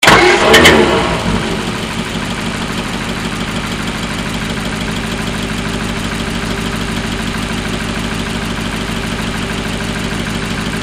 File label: ford customline